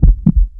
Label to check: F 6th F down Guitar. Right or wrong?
Wrong